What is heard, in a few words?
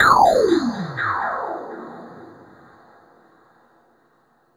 Zap; BERZARRESOUNDZ